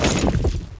concrete, debris; XDM

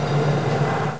push glass; XDM

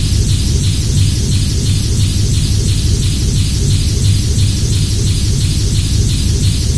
machinery; XDM; ambience

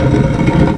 push stone, debris; XDM

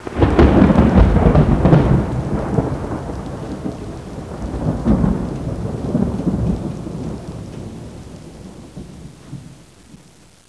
thunder clap; hl1mp; damncross

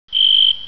bird; XDM; ambience

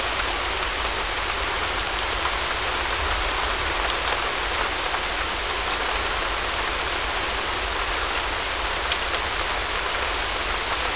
rain, ambience; XDM

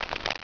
rat idle; XDM

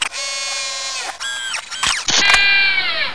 turret retract; XDM

